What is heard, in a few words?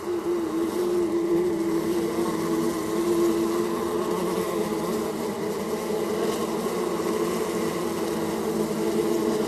bee Hive Sound; Soulforge Tower Defence